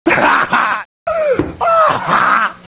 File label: haha; dod